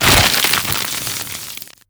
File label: host submerge, creatures, headcrab reviver